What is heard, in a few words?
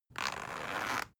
zipper soft